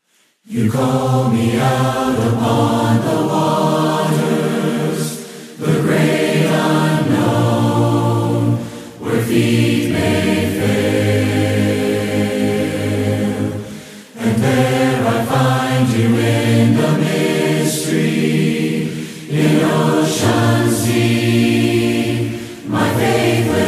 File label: praise harmony recording singers